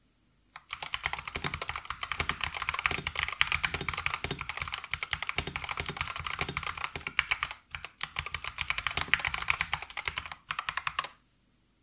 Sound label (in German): SGK50 S3 PBT Tippen